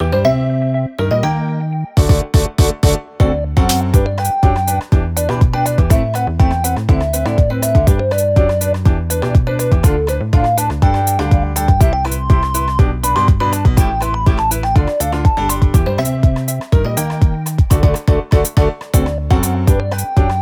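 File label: BGM Light Board Result Win; Clubhouse Games: 51 Worldwide Classics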